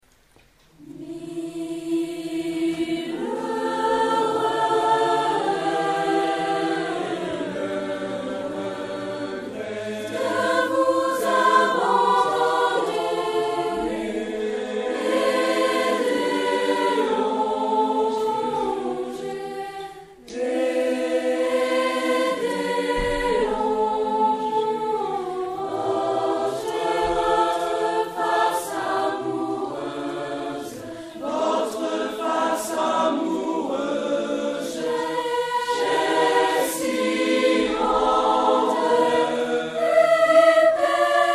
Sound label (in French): josquin mille regrets concert; live au miroirs